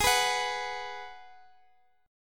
G sharp Minor 7th, Guitar Open F; strummed; G#m7; x,x,x,15,14,13

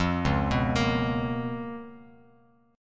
C sharp 13th, Bass Standard; arpeggiated; C#13; JGuitar

